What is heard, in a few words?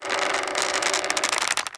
bridge rope squeak